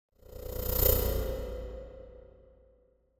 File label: Whoosh Stutter Fast Pulsate Fear Pass; Hollywood Edge; sound designer's toolkit v2